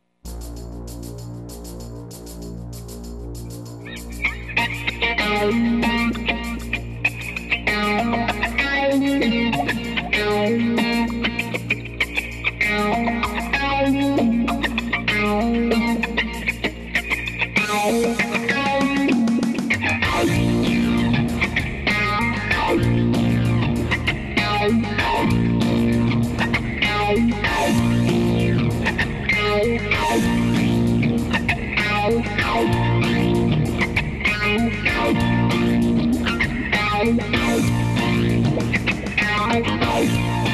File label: BIG Whoosh; Myst Ways